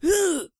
pain, Zombies; zps; Vanessa

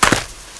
dirt, player; svencoop

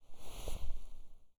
M4 Draw; zps; Weapons; Firearms

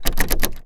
Door Knob Locked; zps; Ambient

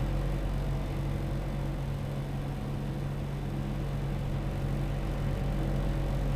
Ventilation; zps; Ambient; Vents

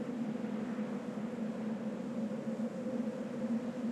Outside wind; zps; Ambient

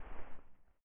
ppk draw; zps; Weapons; Firearms

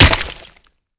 Flesh Arm, Gibs; zps

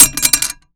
Machete Collision; zps; Foley

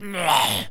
Zombies Male Jump; zps; Male1

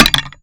Hammer Collision; zps; Foley

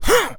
jump, Human Male; zps; HumanMale-01

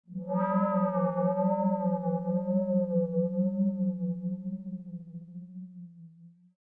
shredding synthhit; zps; Ambient

